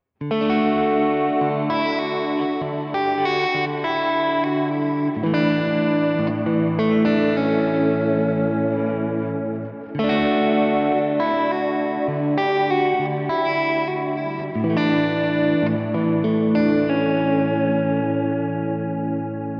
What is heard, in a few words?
Reverb Room